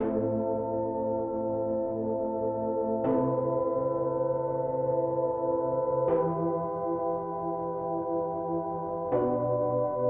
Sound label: 158 BPM E Min Pad, loop stems; Cymatics; 2022 Melody Collection; Weakend